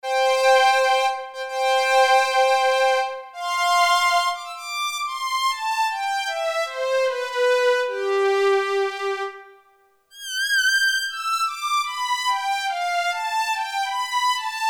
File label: VIOLIN, Roland Jupiter-8; Factory patches